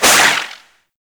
Incarnon Void Projectile; Weapons; Warframe; Duviri; Incarnon Adapters

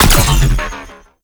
Space Fighter Elite Laser Fire; Warframe; RailJack; Corpus; Space Fighter Laser Agent

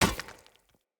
Short Sword Medium Impact Rock

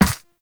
Operator Run Ice, Footsteps; Warframe

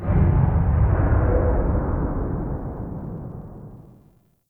Big Hit; Warframe; Ambience; Nokko Colony

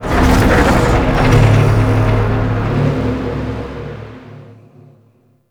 Void Dragon Move Start; Warframe; Duviri; Movement

